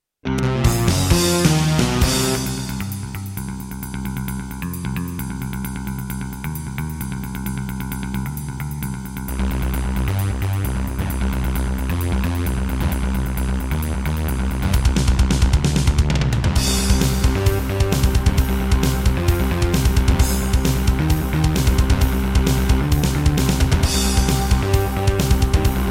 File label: Roland JV-1010; sigil doom episode 5